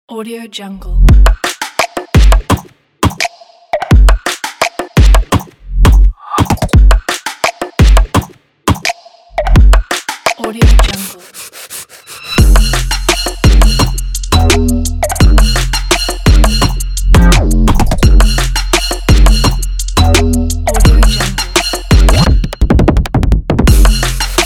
stomp

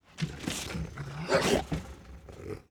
wolf snorts; prosoundfx

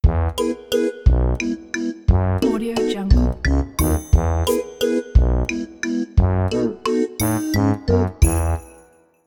funny slow chase logo

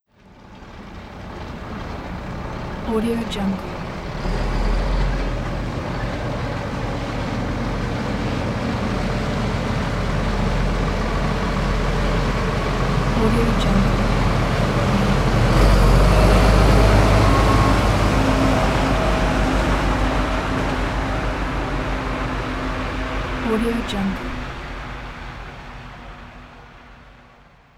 truck passing by on wet road

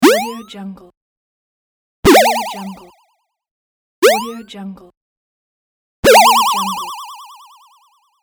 retro jump

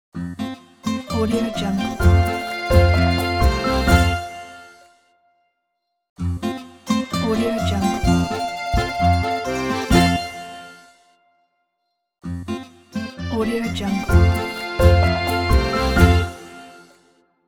beautiful italy logo

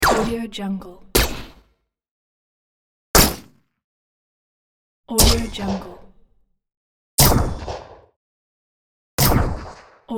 designed game futuristic gun shot

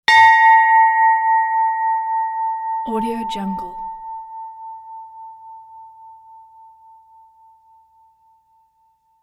single very small church bell in a

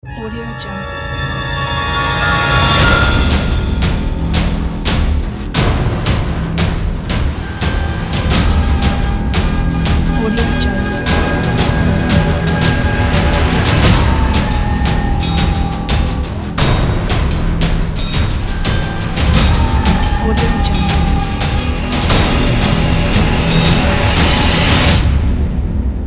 tension intro trailer; ztarrzound

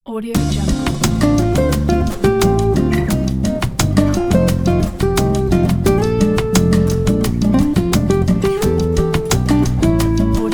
latin loop

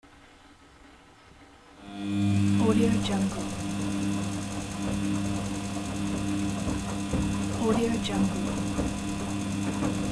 washing machine

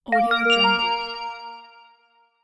notification message; blackdragonfx

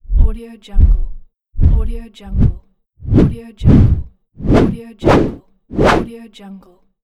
deep woosh

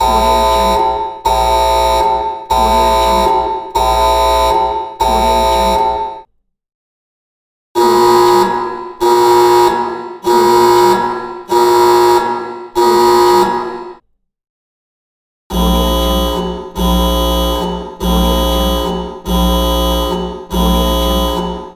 military alarm

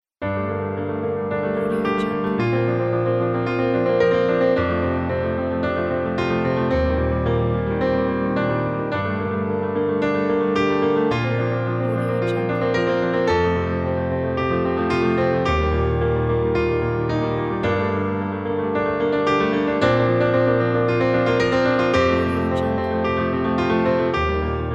inspiring dramatic piano

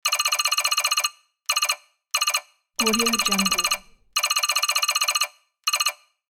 data transfer

